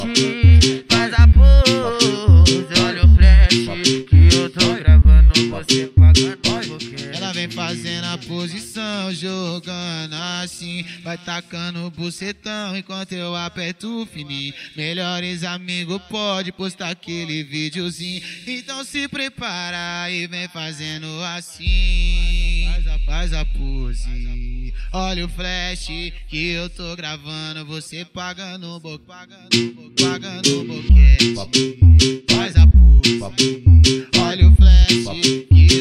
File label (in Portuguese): cd retro funk; pista antiga; funk antigo